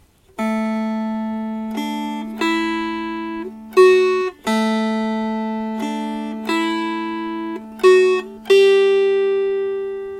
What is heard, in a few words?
Melody